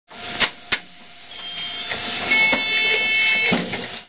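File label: Door Close, Train; OpenBVE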